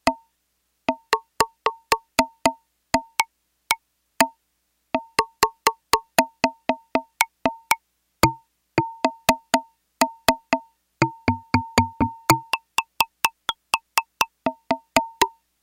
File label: Claves, Yamaha TX802; Factory Patches; Voices